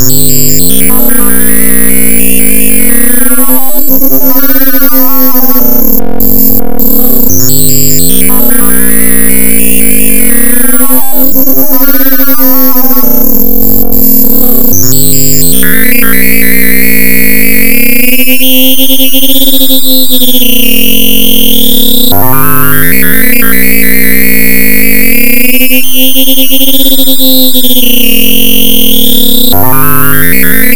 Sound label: Data Sonification Ethanol Consumption